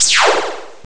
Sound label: super zapp; Amiga Soundtracker Sample Packs